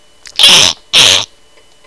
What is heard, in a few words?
monkey; They go eee....eee...eee...